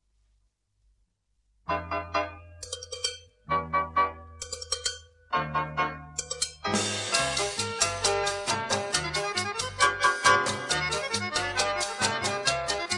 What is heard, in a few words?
LAUGHING CHA CHA